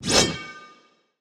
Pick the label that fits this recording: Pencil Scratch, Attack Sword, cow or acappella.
Attack Sword